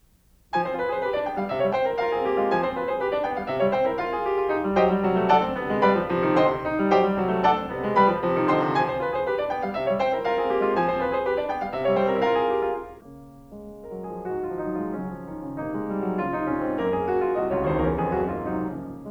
Carnaval for piano; Schumann; Op9

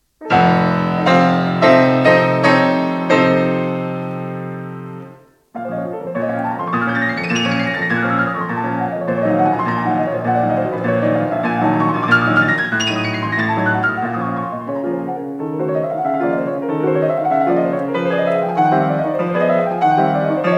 Preludes; Chopin; Op28; Lympany

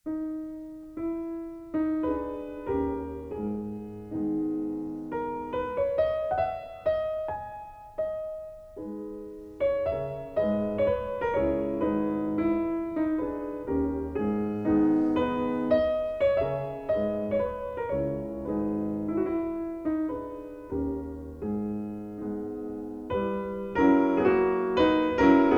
Mazurka; Chopin; op33-1; Malcuzynski

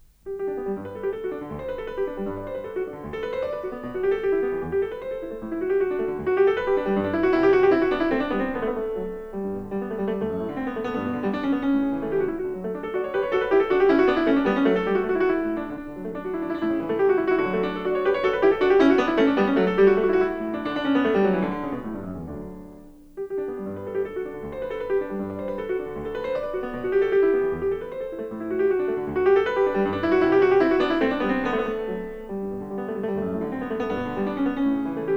Handel Keyboard Suite No8 HWV441; Heidsieck

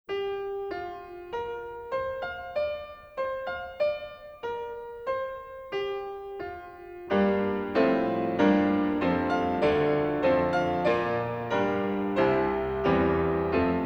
Pictures at an Exhibition for piano Promenade; Mussorgsky; Graffman

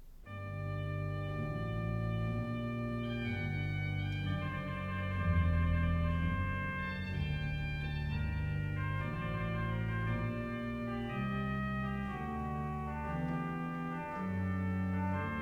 Organ Concerto; Handel; Op7-4; HWV309

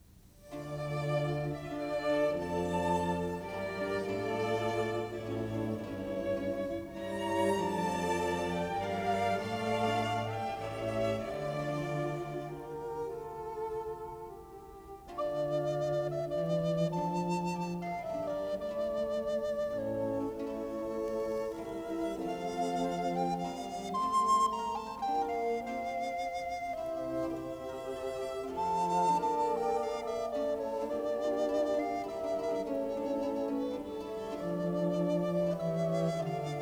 Recorder Concerto; Handel; Op4-5; Marriner

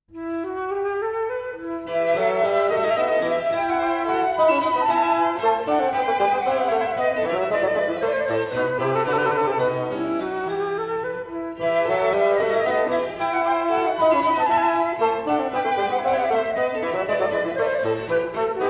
Quartet in E minor; Telemann; TWV43-e4

